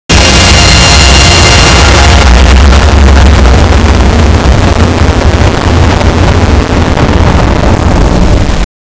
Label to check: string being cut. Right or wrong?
Right